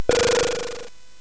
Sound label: SFX; Narco Police; hvsc